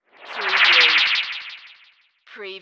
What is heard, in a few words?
Magical Whoosh Chopper